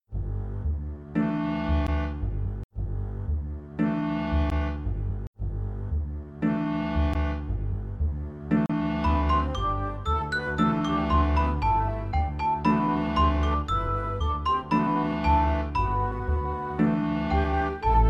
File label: background